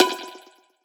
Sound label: Impact FX